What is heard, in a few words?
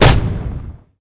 beat; mengji